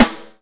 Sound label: Y9 Snare; Akai MPC500